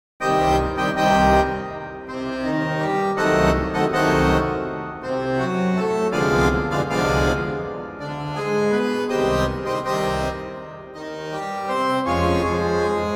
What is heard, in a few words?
Grand Choeur, harmoniums; P Posthumes; Franck